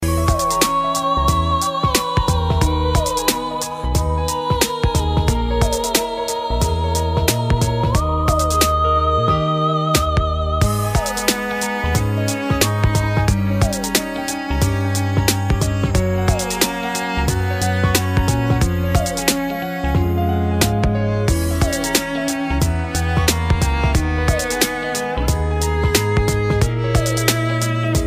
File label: Korean Melody